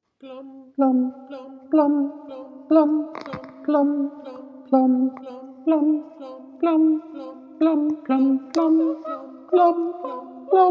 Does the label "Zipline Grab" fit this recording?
No